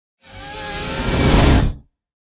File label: Metal wires transition